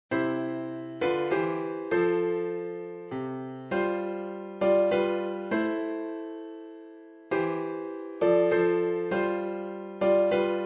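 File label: trumpet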